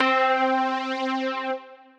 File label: Light Sweep Pad, Synth Pad